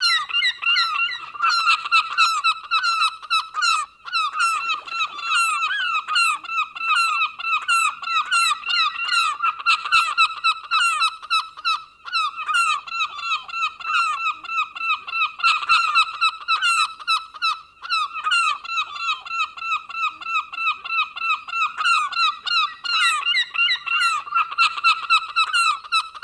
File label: Seagulls Ambience Loop; Animals; XBOX; BassProShopsTrophyHunter2007